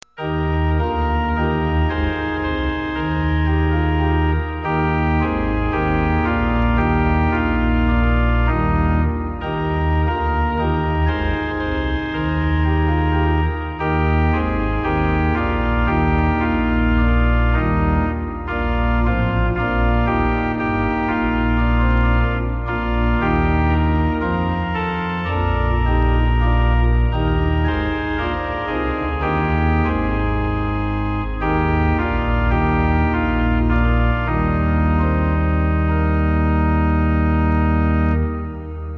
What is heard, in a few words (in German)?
Nun danket alle Gott